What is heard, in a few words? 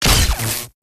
security bot electric shock; gow; fortifications